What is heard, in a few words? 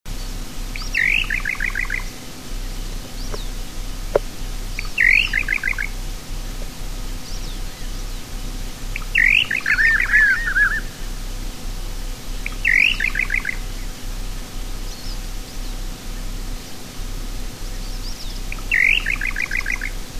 Little Nightjar; S. America